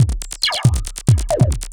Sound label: percussion loops 138; PS-45